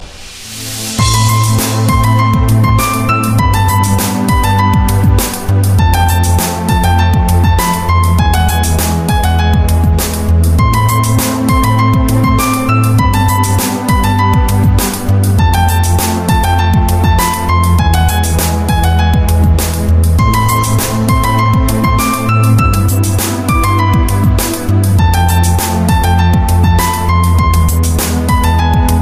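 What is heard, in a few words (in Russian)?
классическая музыка и ретро рингтоны на телефон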